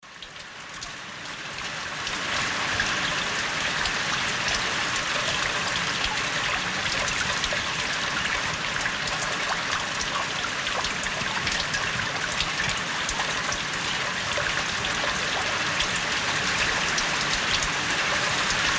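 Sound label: Heavy Rain Pouring Drai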